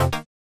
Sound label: incorrect